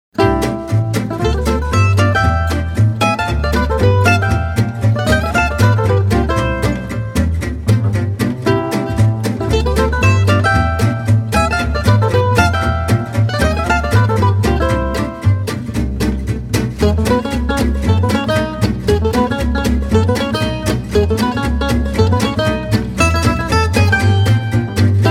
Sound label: Swing